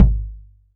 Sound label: Kick Drum; drumthumper; oboe